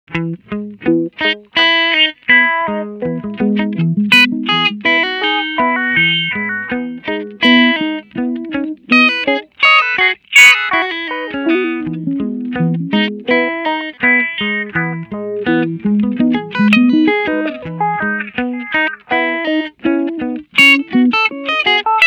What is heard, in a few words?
Sweep Echo